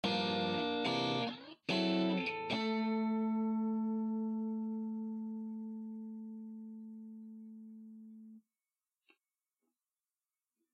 noise

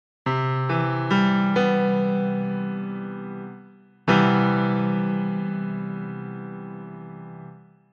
major7

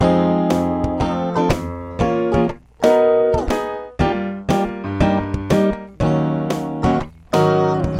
last chord

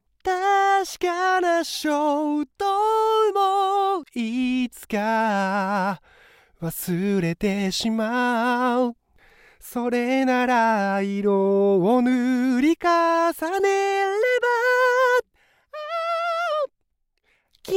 vocal

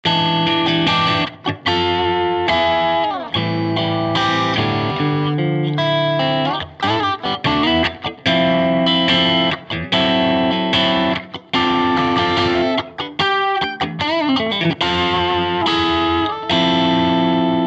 guitar bias room

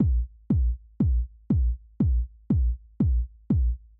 kick after

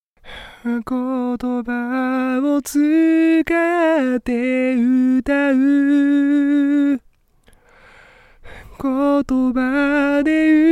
vocal

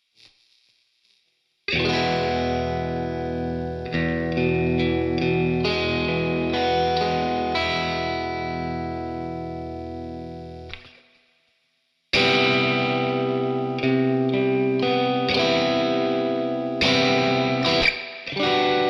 amp wo booster

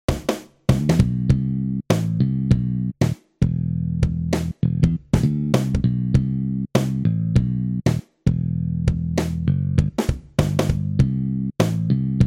song bass only

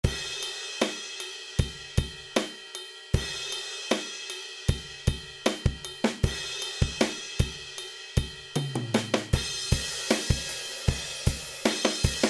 drum adjusted

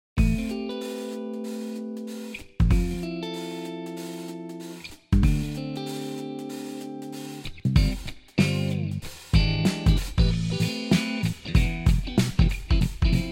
guitar

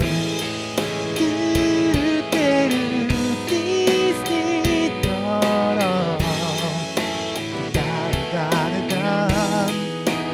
no sabi out drum

